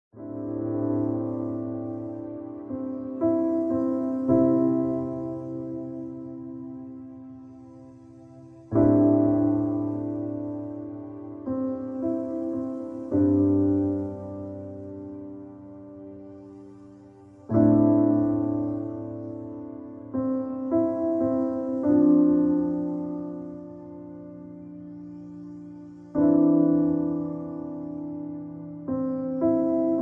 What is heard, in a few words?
Piano Relaxation